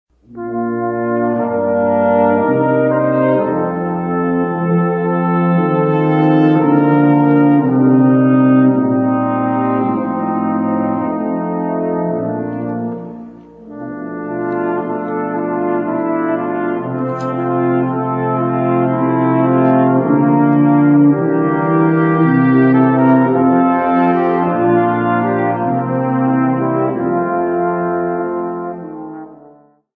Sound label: Brass Quintet